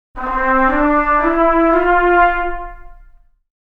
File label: Mono, brass; Cinebrass